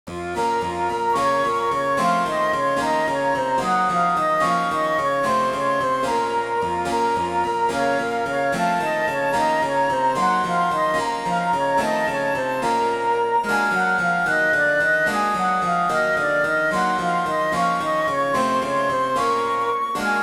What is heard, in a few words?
Barokko Melody